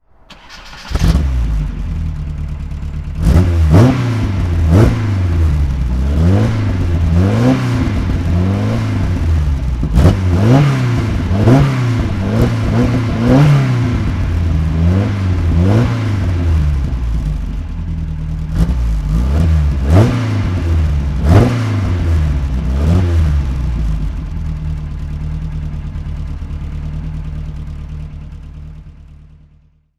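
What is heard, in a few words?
ford escort rs2000 throttle, engine sound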